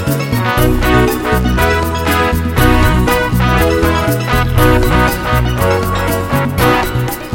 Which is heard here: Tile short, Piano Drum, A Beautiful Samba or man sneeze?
A Beautiful Samba